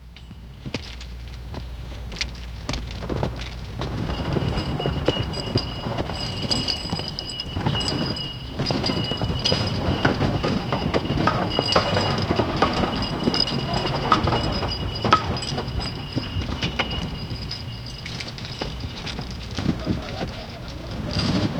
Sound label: rhythmic shovel strikes; labor